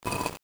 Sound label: take stone